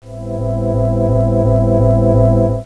keys

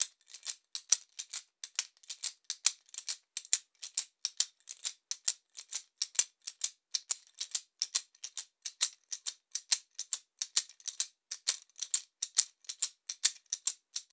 Dogbone shaker; Warrior (Tohoqashaw)

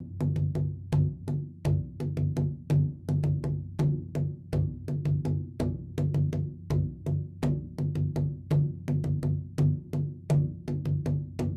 Big Drum hands; Little One (Teetich)

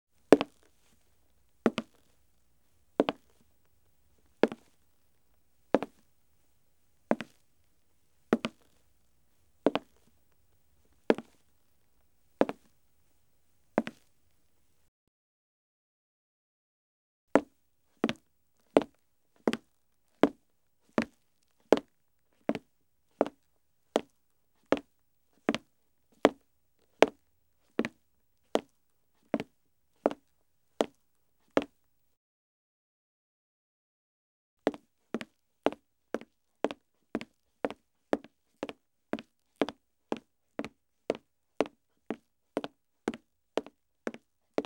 steps wood slow; Asian Show Sound Effects

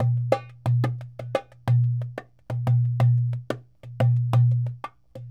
Dumbek

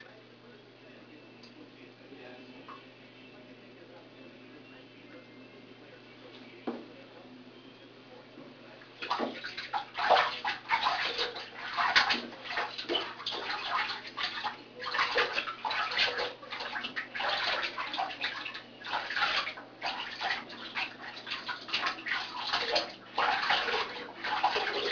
sink

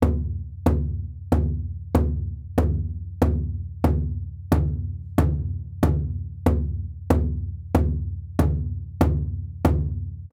Big drum; Nightfall (Tabbyewik)

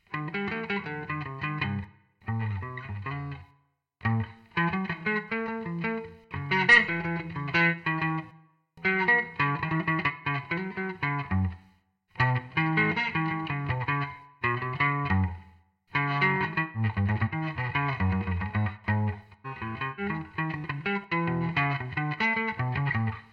gtr

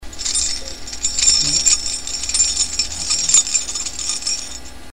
keychain

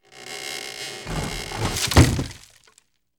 wood med creak fall; Asian Show Sound Effects

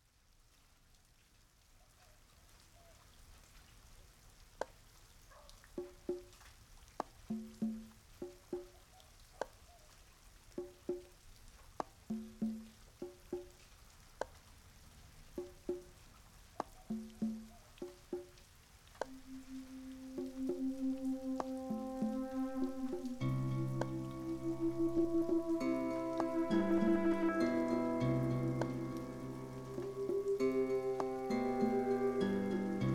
rain scape

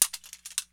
drop stapler; kontakt

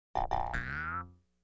electronic twangs; music electronic; Asian Show Sound Effects

